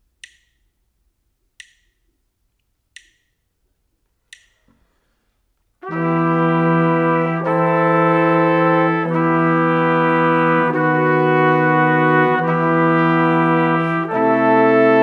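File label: harmonic dictation, brass quartet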